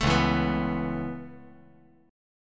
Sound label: Db Minor 7th Sharp 5th Db down, chord; strummed; JGuitar